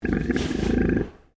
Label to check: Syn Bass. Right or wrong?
Wrong